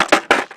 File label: skateboard; bucky